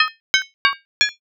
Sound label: pinball